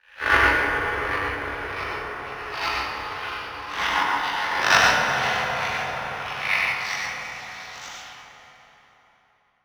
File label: Transition Stab Dark Medium